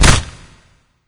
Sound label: chomp; doom3; hellknight